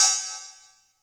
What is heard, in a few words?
Cymbals, Elektron MachineDrum; SPS1 MKII